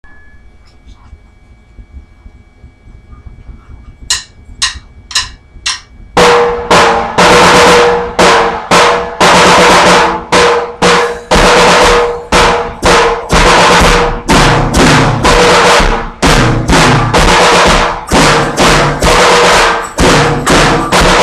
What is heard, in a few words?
DRUM SOLO WITH TAMBOURINE